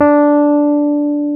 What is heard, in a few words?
WURLY D3; Vintage Combo; E-MU Formula 4000 Series Vol. 3; Analog Odyssey